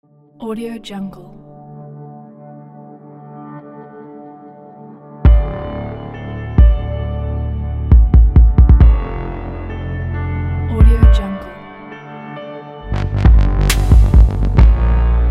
stylish presentation opener

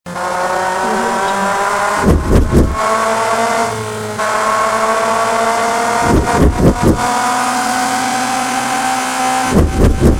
sports car passing objects fast

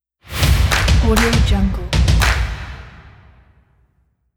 percussion logo

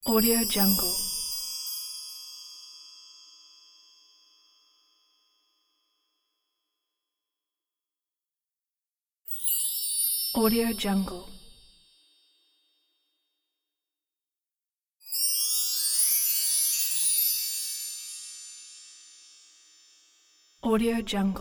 chimes

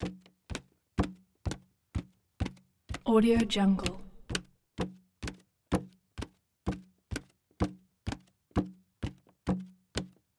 footsteps wood; prosoundfx

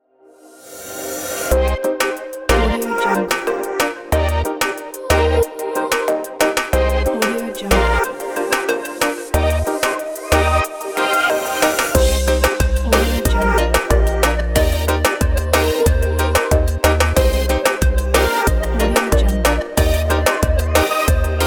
catwalk lifestyle logo

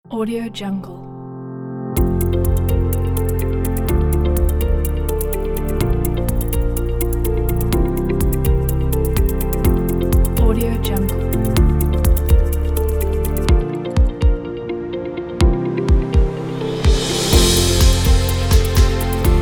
ambient music

